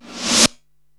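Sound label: snare drum Reverse, Drum Machines, Korg TR-Rack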